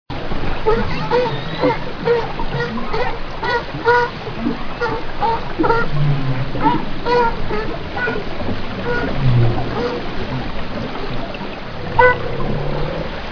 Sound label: calif sea lion